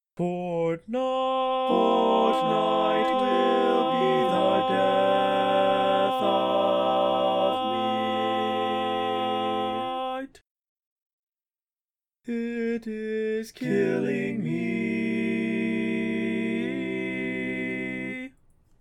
Bass, barbershop tag

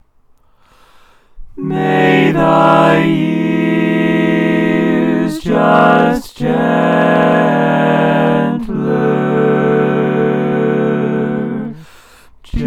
All Parts, Barbershop Tags

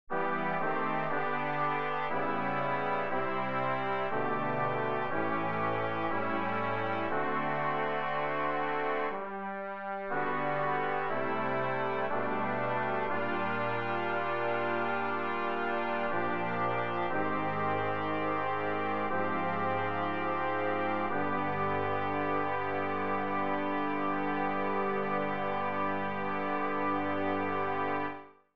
Bass, barbershop tags

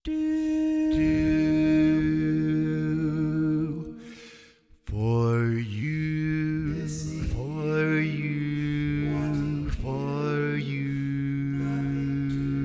Bass, barbershop

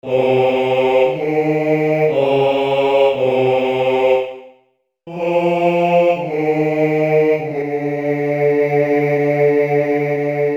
Lead, barbershop tags

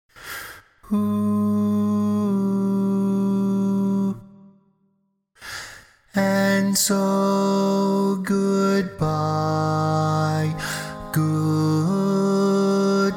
Bari, barbershop tags

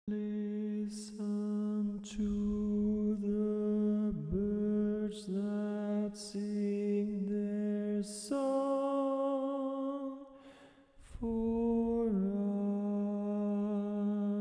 Springtime, Lead, Barbershop

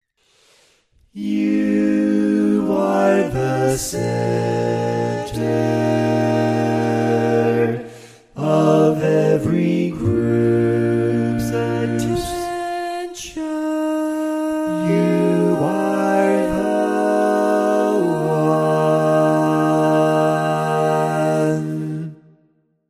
Bass, barbershop tag

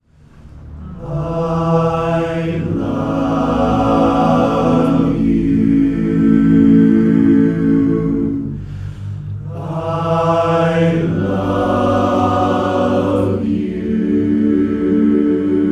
All Parts, barbershop tags

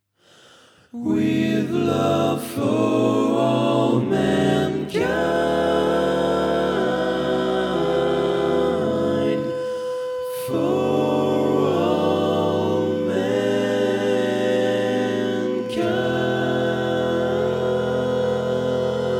barbershop tags

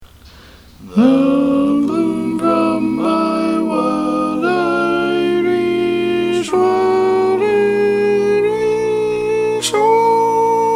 Tenor

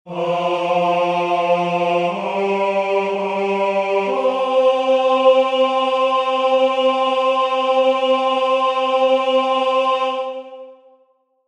Lead, basic tag, barbershop tags

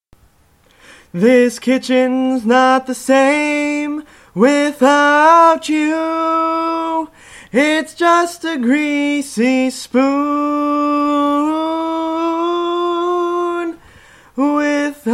Lead, barbershop tags

